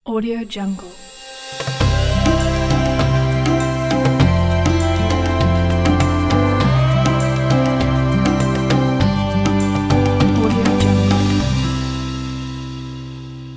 ramadan logo

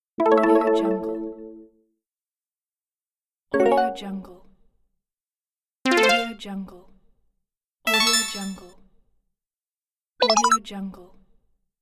winning arpeggios

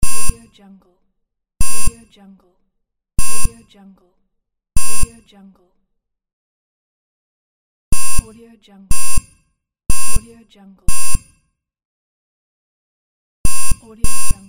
heart monitor

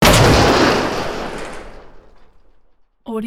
matter mayhem metal billboard impact; soundmorph

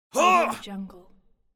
male short grunt

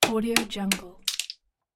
crack a nut; crisstyna

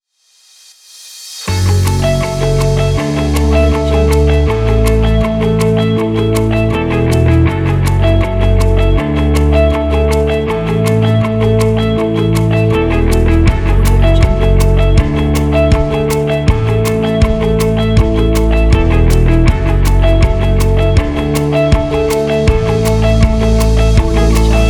inspirational guitar

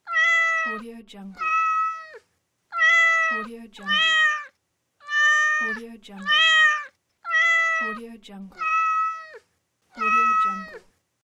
cat; erhanbicici

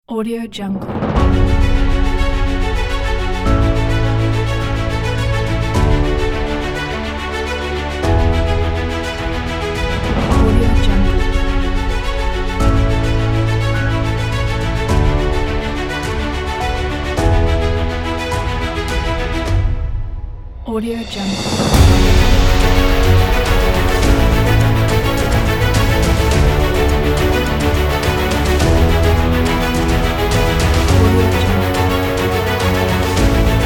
adventure epicness

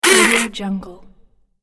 digital camera closing lens

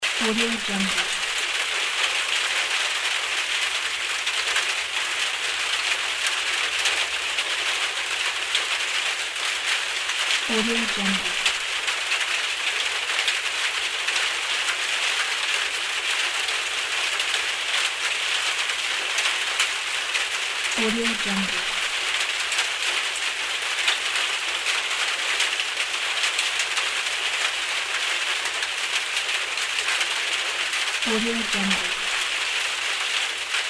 rain falling on aluminum roof run in shed

